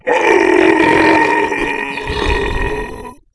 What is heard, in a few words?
zombie die